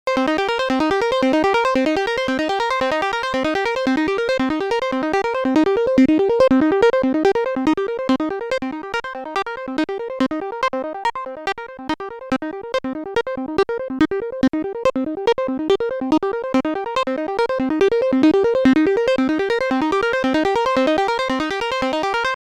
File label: arp accent